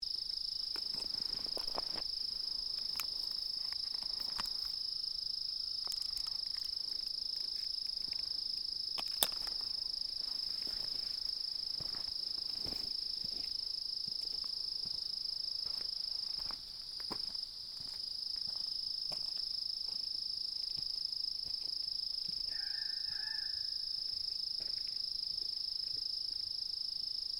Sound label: Golden Gully at dusk; Camilla Hannan